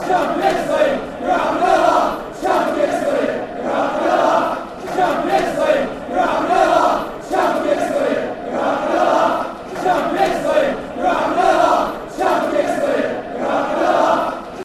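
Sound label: champions league stockport, football chants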